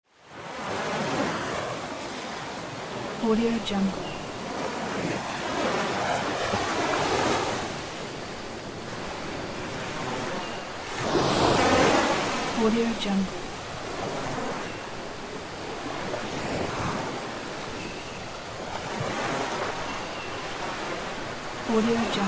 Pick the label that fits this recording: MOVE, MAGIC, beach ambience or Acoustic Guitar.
beach ambience